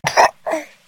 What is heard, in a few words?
baby burps; CosmicRage